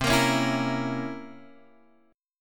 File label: C 7th Flat 9th C Guitar Open G